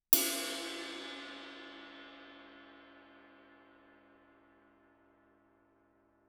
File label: crash stick normal ff stereo, Suspended Cymbals; Percussion